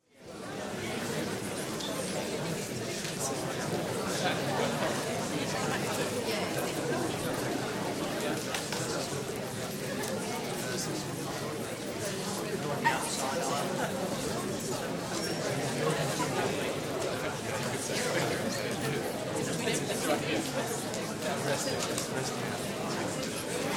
Theatre Audience, mixed animated chatter before performance; Theater; BBC